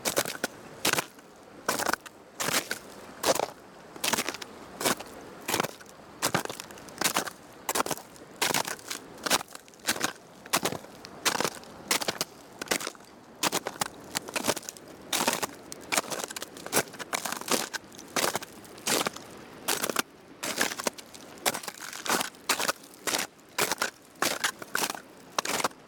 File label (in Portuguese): passos